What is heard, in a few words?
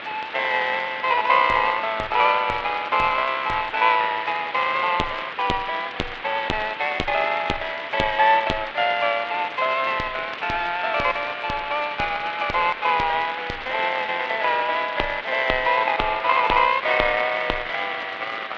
harp phono; antiquing